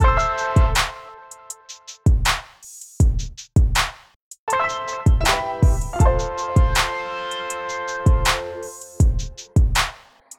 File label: Hail instrumental